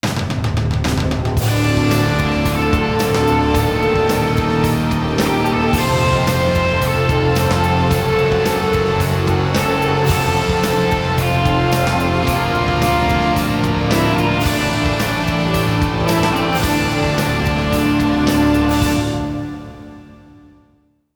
Combat; sid meier s civilization revolution 2 2015 vita

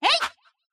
slash; Mystical Ninja Starring Goemon

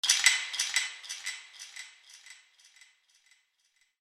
wood

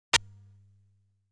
Damage Sound

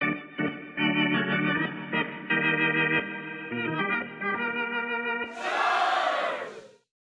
organ charge

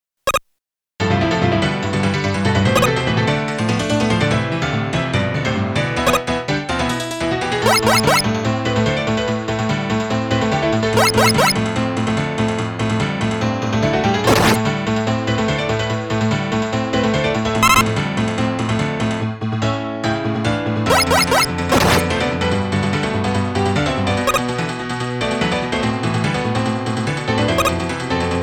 Magma Burning, piano remix; Rockman Piano Remix

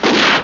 Pistol

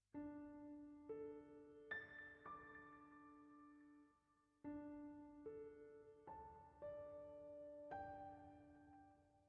Piano

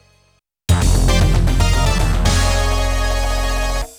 Round Start

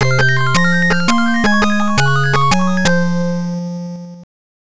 Game Clear; V-Tetris; Virtual Boy

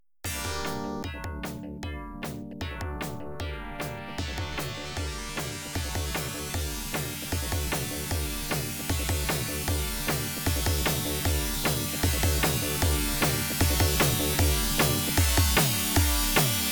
Catwalk Timer, pinball; Phantom of the Opera; Data East

